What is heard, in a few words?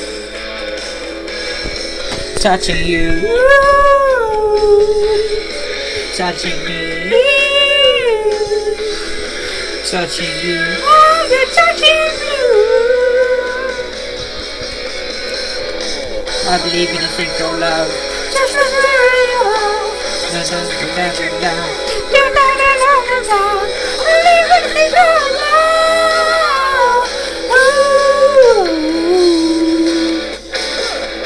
singing